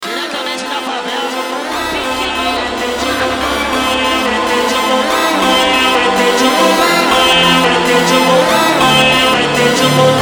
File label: Funk Sped Up